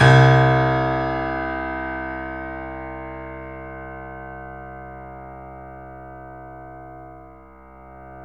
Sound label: 3D Pianos, Yamaha C7; E-MU Producer Series Vol. 5